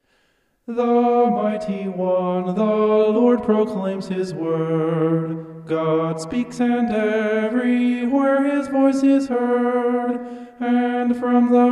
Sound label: vocal homoph; psalm 50